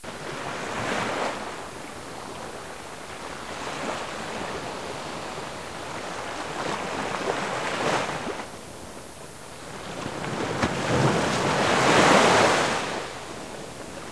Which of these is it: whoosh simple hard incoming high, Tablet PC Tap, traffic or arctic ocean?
arctic ocean